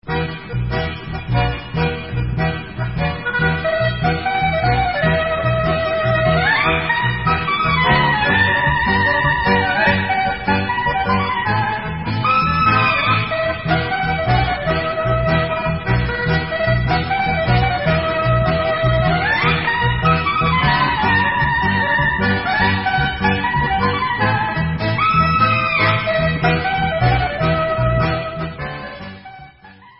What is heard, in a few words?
Instrumental